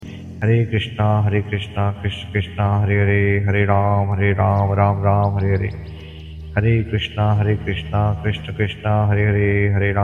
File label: Hare Krishna Mantra